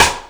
dance rim; USB Soundscan vol.11; AKAI; DANCE KIT

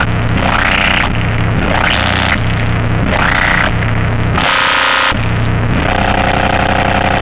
RUMBLE, Hard & Loud Techno; USB Soundscan vol.01; AKAI

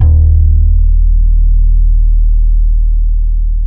BASS, D#1; Zero-G; Chemical Beats; BASSES

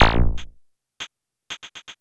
Percussive

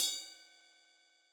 Yamaha DJX 2B, Ride Cymbal Cup

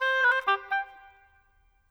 Oboe, Orchestral; Sonic Foundry (Sony Creative Software); Orchestral VOL-2; Modern 27

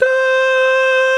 Male Scats; 90s Sample CDs; Club-50 - Foundations Roland

